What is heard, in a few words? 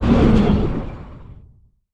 Dread Heal; Sid Meier's Civilization 4 Complete; Beyond the Sword; Units